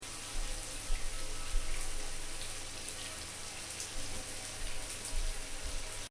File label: Shower loop, Shower, Light: Running; Bathroom; Household; Stuart Duffield